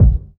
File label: perfect kick drum sample a key, steel kick drum tuned to the A note; kick drum samples